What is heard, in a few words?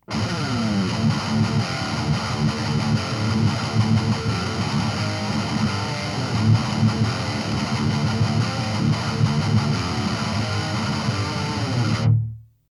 Fender Strat Dropped D Line6 Spider4 SM 57 Millennia HV 35